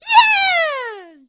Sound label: yeaaaaaaaah